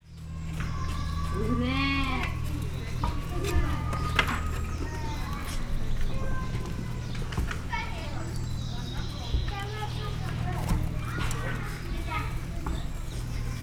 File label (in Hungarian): szamár patadobogás; szegedi zoo 2011